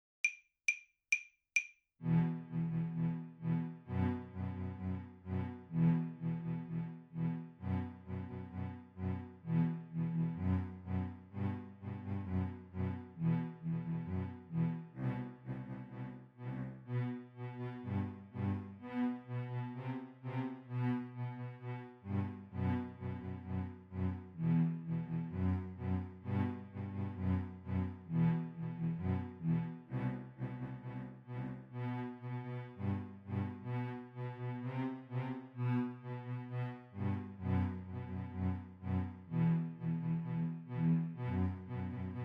Contrabas Solo

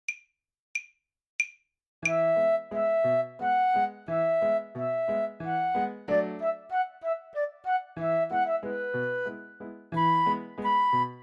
Fluit; Sakura

